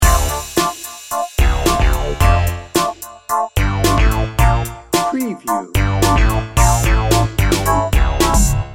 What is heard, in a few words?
bright and pulsing loop